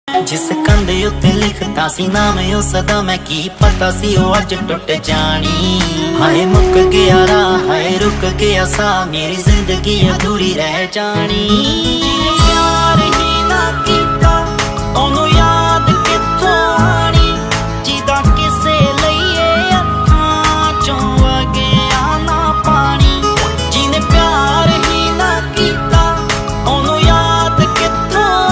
Punjabi Beats Ringtone

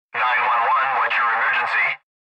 Emergency Ringtone

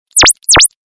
Zap Ringtone

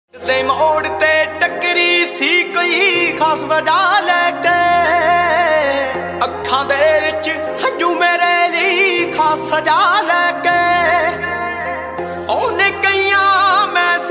Punjabi Ringtone

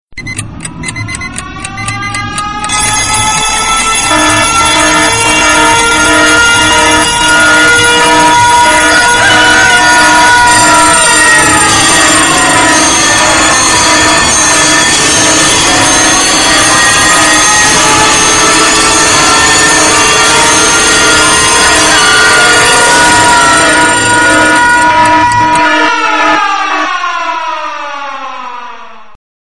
Differents Alarms Ringtone

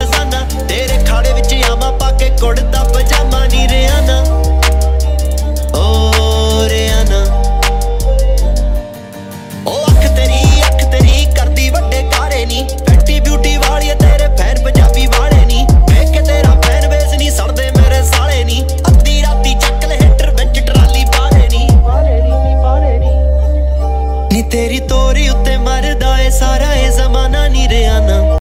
DJ Punjabi Ringtone

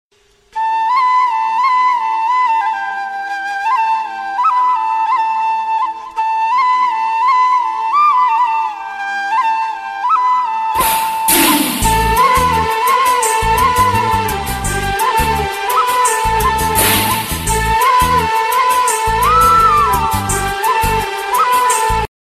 Romantic Flute Ringtone

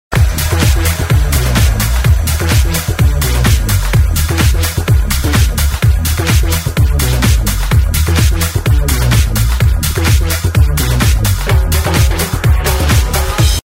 Samba Soul Ringtone

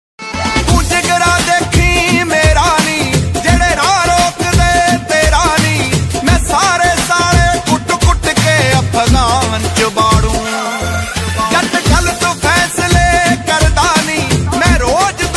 Pendu Punjabi Ringtone

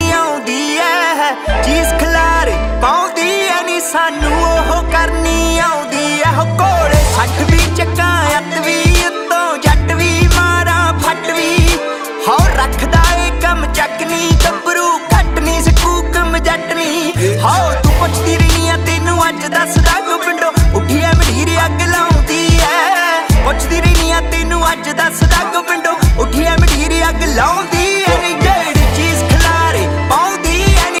Dance Punjabi Ringtone

